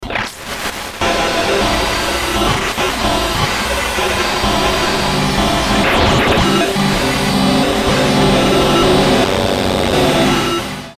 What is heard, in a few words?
Tuner Static Distortion Warble; Communication